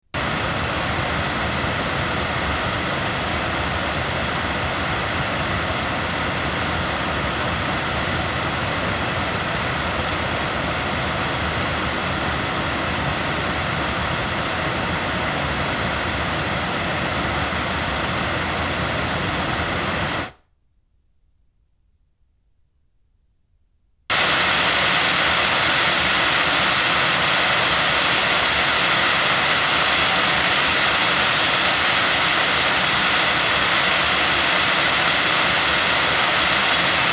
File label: Blue Encore200 loudspeakers